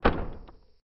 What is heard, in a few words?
switch